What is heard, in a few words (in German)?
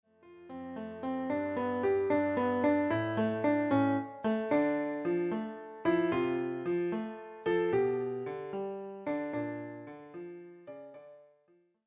The House of the Rising Sun, Klavier